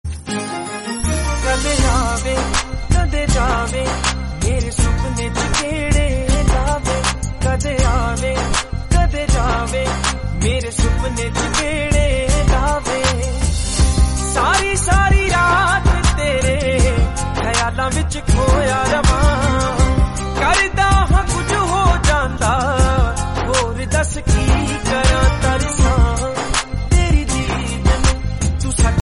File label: punjabi ringtone